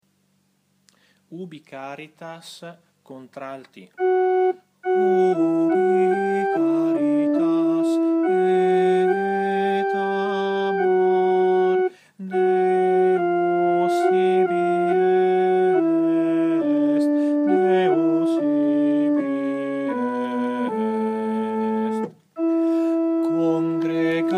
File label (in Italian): Contralti